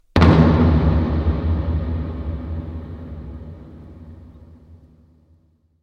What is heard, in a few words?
Drum Sound